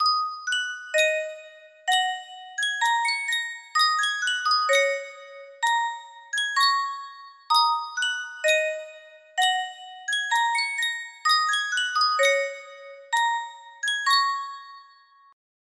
music box, music box melody

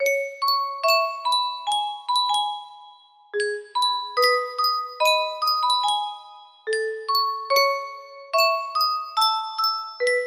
yunsheng custom tune music box

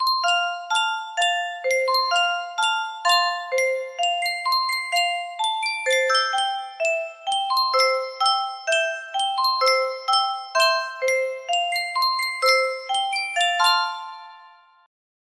Jokiwa music box

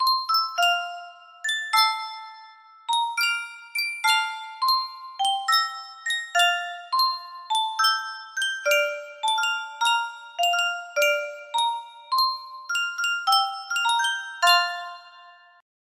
music box, music box melody

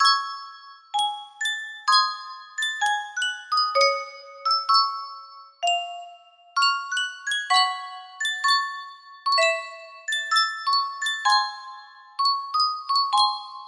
sankyo music box; nier replicant

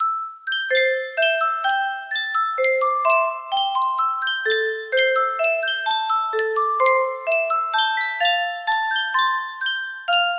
yunsheng custom tune music box, music box melody

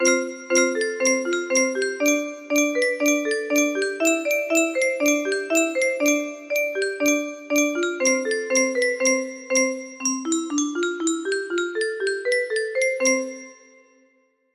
8 bit, melody

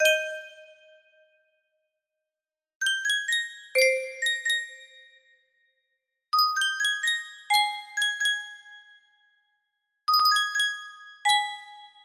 Sankyo custom tune music box

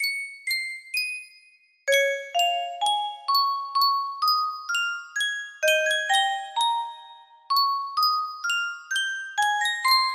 Yunsheng custom tune music box

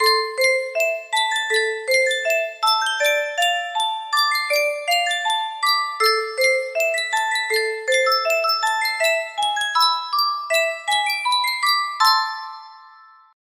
Yunsheng custom tune music box

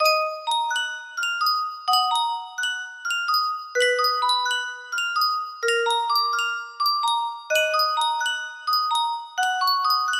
yunsheng music box, music box melody

